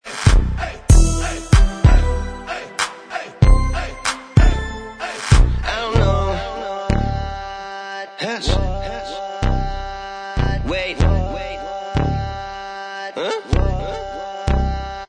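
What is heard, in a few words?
backing vocals, backing track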